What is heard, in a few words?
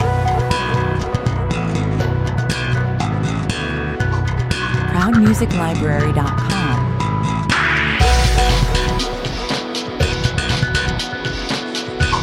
thunder rock 120 bpm